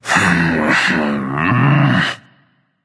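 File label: nonverbal grumble; smite; voicepack; merlin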